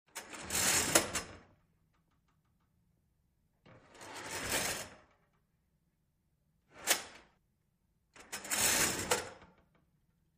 Sliding Glass Window

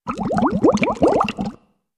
Big Ploopy Bubbles, water

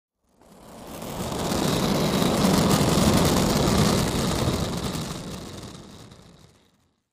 Roller Blading Coas

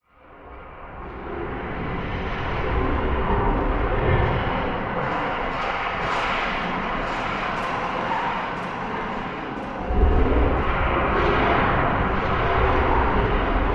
Insanity Quick Tinny, deep

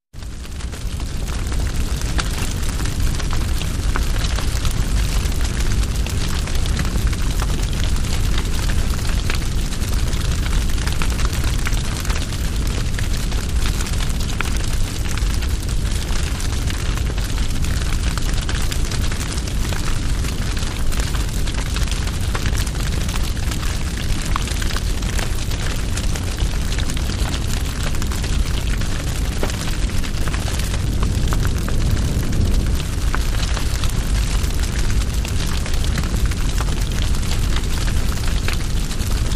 Exterior Campfire

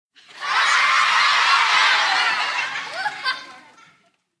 Group Children Laugh

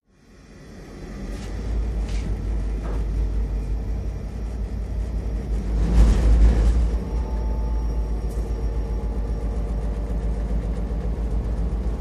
Train Start Up Throt, diesel

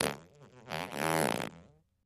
Character Fart But; farts

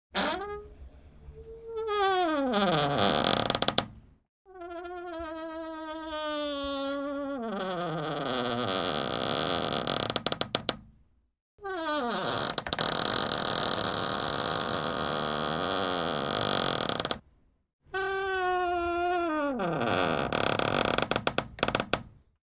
Door Wood Creak Very Long; hinges creaks

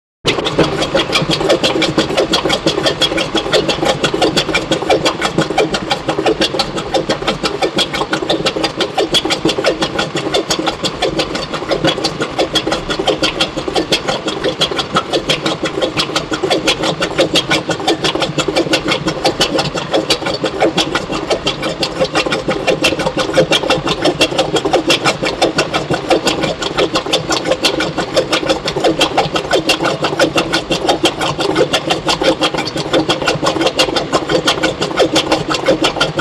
Chocolate Factory, factory

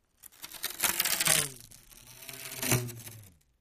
Rip Stretching Stro, plastic

